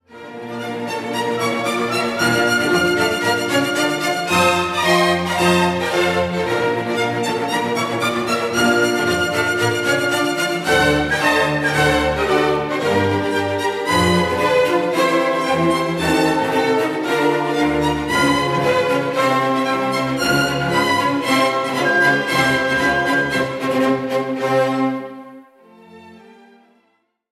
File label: vinyl restoration classical